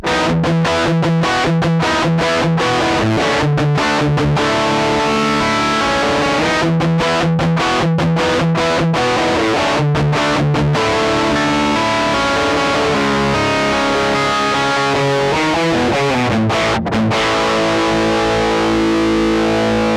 panama style, distraptor; tl distraptor; tonelib

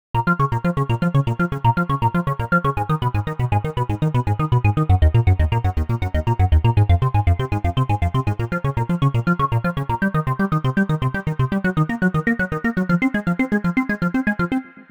dry trigger; the analog sound waves

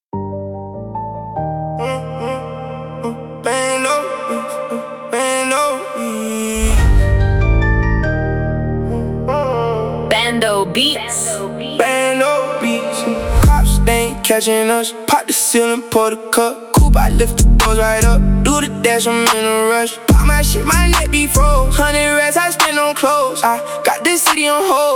trap streaming loud; transientik master; transientik labs

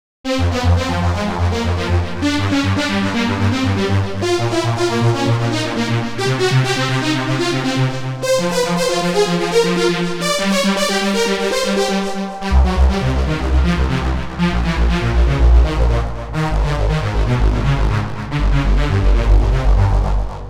arp; the space soundset; berkelium

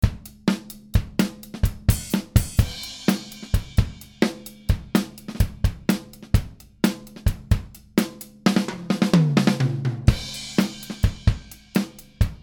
Flat Drums Group Bus

